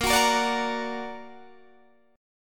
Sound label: Bb Augmented Bb down Guitar Open D